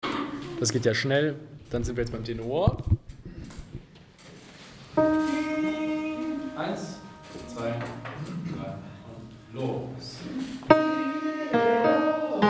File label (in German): Tenor; Ti Elotse